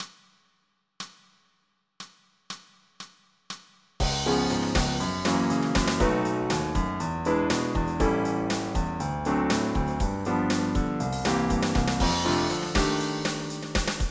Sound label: blues; 3800 MIDI Magic